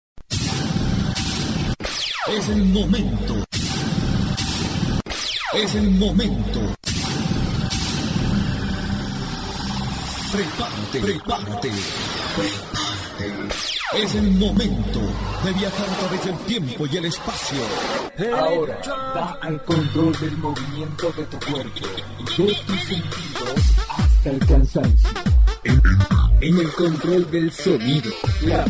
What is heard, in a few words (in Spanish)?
disco mix